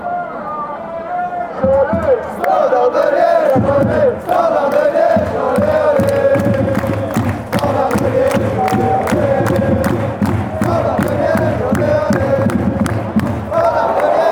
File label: ole; standard de liege